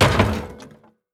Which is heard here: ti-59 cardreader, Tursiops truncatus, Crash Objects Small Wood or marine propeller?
Crash Objects Small Wood